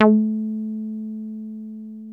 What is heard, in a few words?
303 A3, TB 303, Synthesizer; Hollywood Edge; Giorgio Moroder Rare Synthesizer Collection